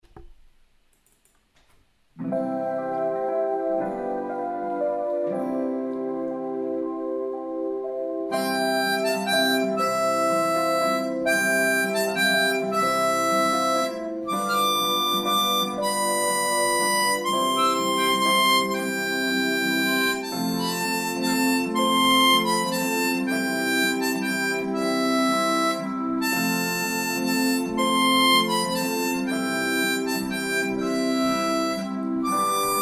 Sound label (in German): Stille Nacht! Heilige Nacht! C, Mundharmonika